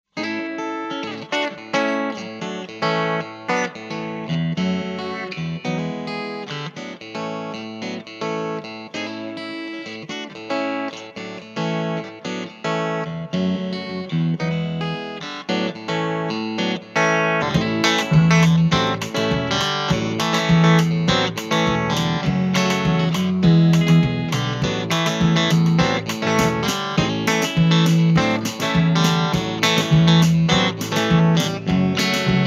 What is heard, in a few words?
ac country